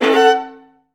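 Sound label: violas F; Best Service; Peter Siedlaczek's Advanced Orchestra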